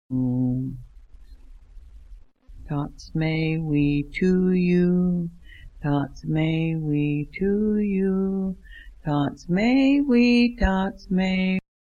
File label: good morning to you birthday tune